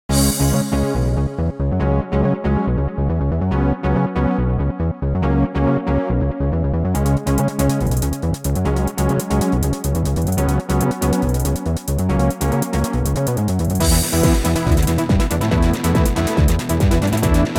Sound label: Pinball, Music; Pure Pinball